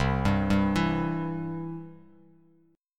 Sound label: C Major, Bass Standard; arpeggiated; JGuitar